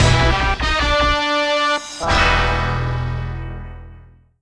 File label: Multiplayer Death Theme [Short]; goldeneye 007; n64; fan soundtrack